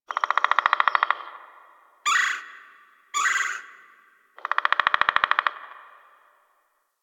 Tree Critters; Warframe; Ambience; Eidolon Plains; One Offs

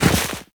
Run Deep Snow, Footsteps; Warframe; Operator

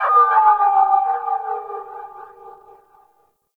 Robot Metallic Textures, Ambience; Warframe; Venus Open World; Objective Rooms

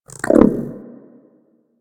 Wyrm Idle, Vocals; Warframe; Pets

